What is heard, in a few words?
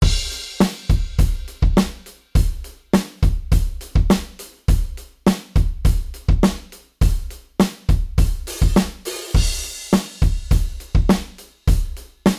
modal surf, drums